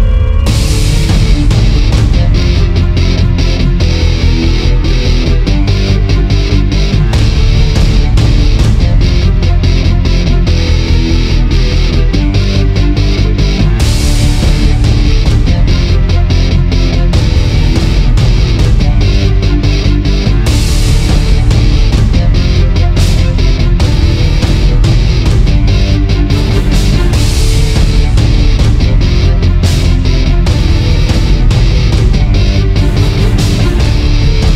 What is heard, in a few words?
Rock; Spacebourne